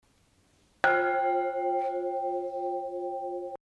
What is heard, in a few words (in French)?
cloche; Brignais